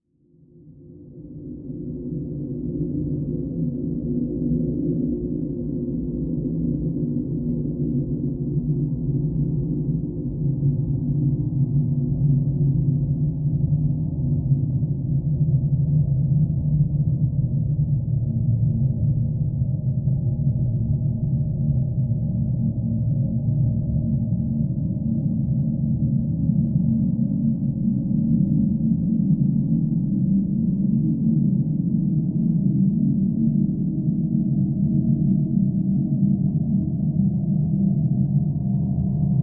drone ambient dark desolate

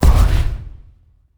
Sci Fi Explosion

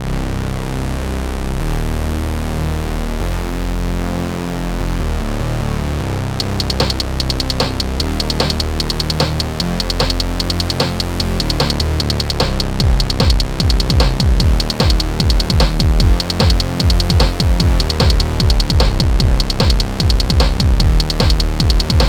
Instrumental; Friday Night Funkin Pumpkin Pie ost pc mod gamerip 2022; Splatter

